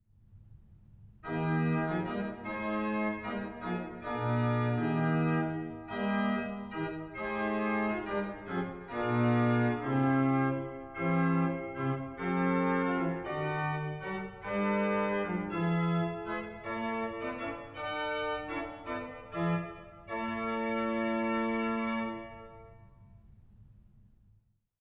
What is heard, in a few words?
Sw to Sesquialtera